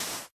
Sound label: sand, dig; Minecraft